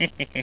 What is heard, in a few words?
smirk